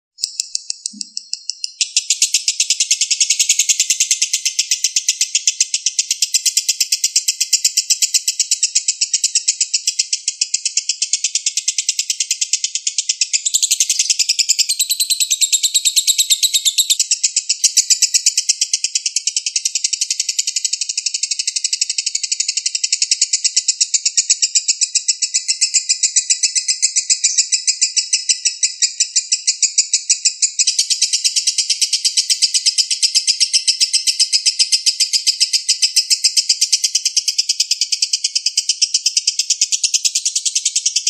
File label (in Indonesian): ngekek panjang lovebird